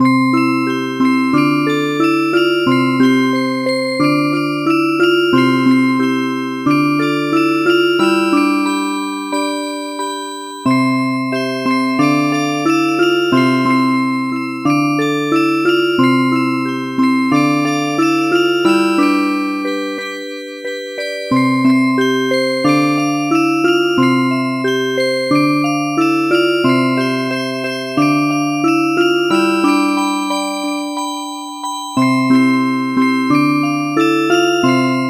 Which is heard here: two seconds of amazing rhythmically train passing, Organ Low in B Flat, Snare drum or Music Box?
Music Box